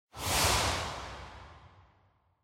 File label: Scene Flashback Short